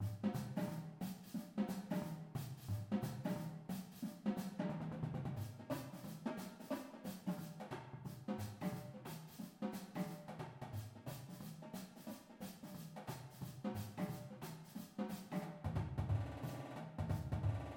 full drumline

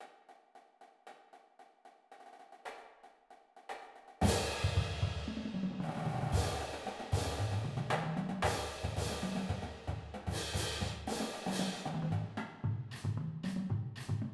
cadence full drumline

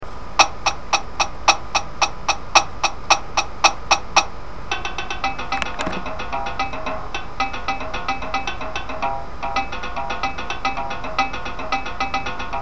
tenors, drumline

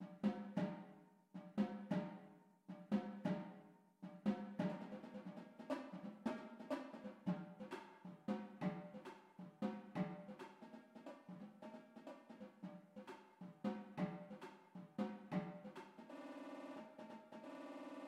tenors, drumline

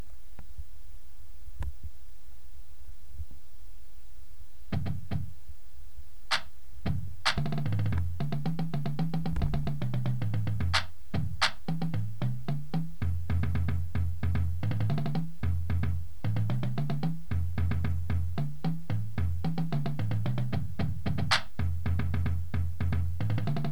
bass, drumline

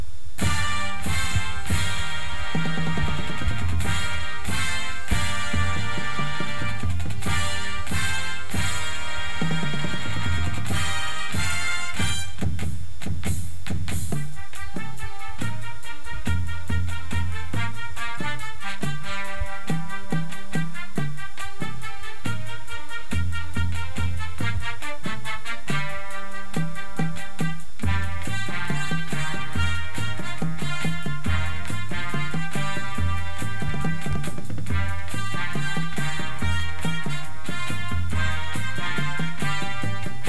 bass with music

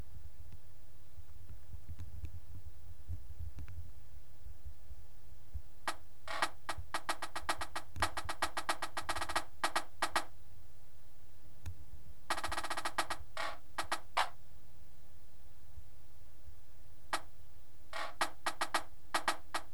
snare

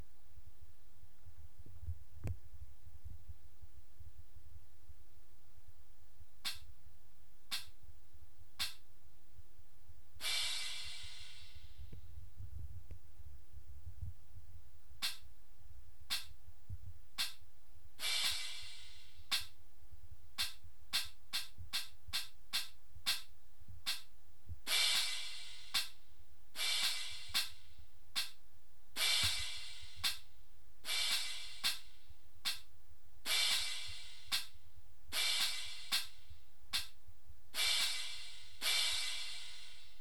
cymbals

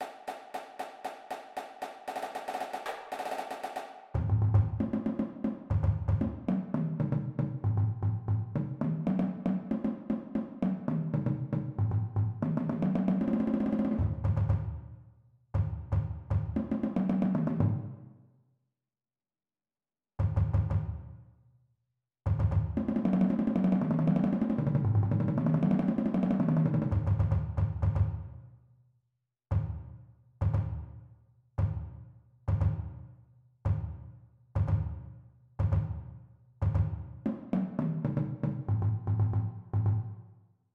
bass, drumline

